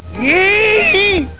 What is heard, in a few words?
yeeeeeee